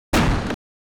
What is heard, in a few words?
fight